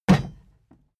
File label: plastic box big kick; maschinen; metall; soundpool